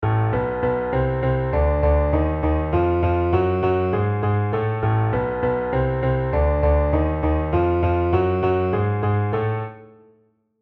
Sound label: Shepard